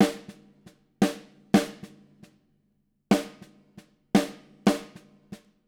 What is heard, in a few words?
154 SNR, NTPASS, Living Drums; Sampleheads; Peter Erskine